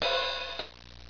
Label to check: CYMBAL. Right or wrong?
Right